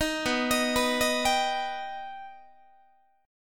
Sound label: B Augmented B Guitar, chord; arpeggiated; JGuitar